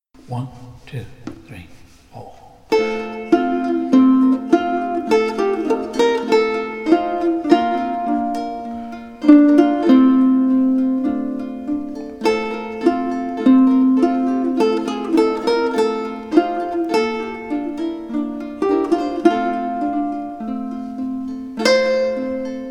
Uke

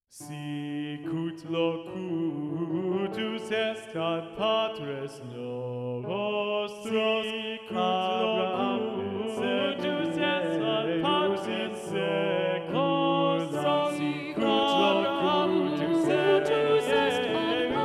Sicut locutus est SATB, Soprano Muted

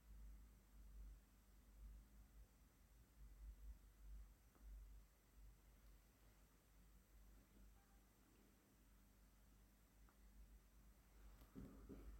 close door

